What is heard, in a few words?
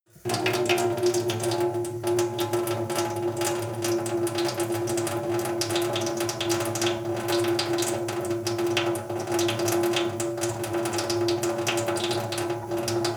sink water flow soft drip long; industrie-wirtschaft; gastronomie